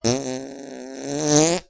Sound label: fart